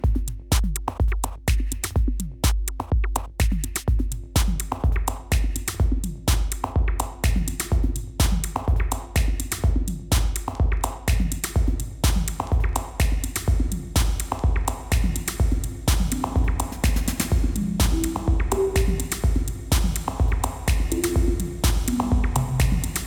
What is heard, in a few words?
Reverb